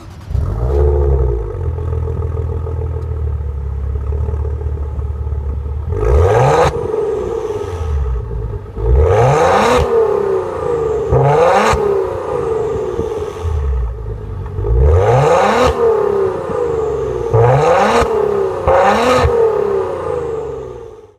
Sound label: MASERATI GHIBLI